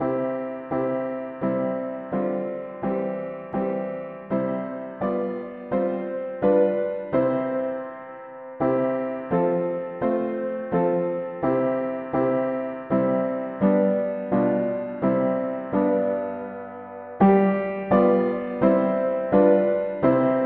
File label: mono piano, hymns; Redempteur fidele